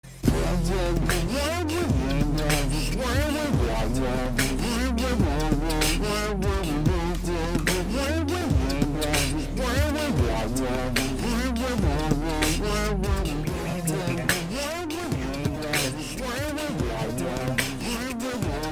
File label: Beat Drop; Peter Griffin